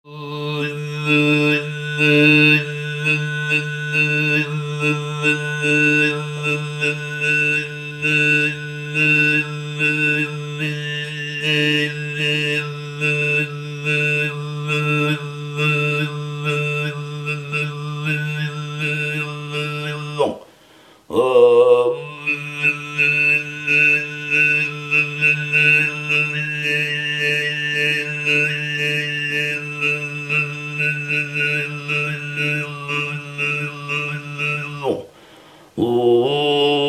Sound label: Tuva Ezenggileer